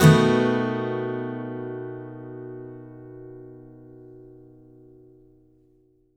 ACCGTR 7TH; Country

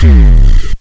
Life Bass; AMG - Prototype Drum & Bass

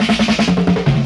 Fills, Drum&Bass; EZRollers

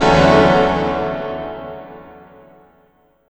CHORD, Drum & Bass, 160 BPM; Ueberschall; Drum & Bass Flash Trackz

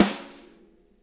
snare, drum kits; Sonic Reality; Interactive Drum Kits

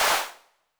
HAND CLAP, 909 Reverb Pitch Width

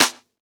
Clap; Drums; Claps; Best Service; House Definition VOL-1

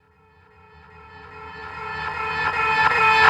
Reverse FX; Sonic Foundry (Sony Creative Software); Club Joints and Street Anthems

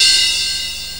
RIDE, CYMBALS

